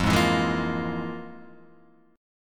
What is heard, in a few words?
F Minor Major 7th Double Flat 5th, F, Guitar, Half Step Down